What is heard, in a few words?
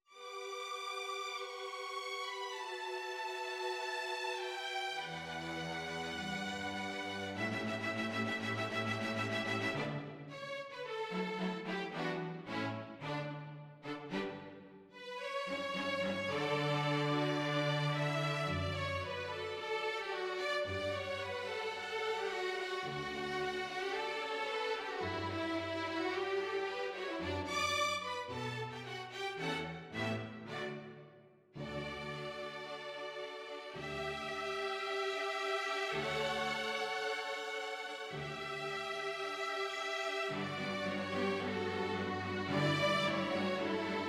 string quartet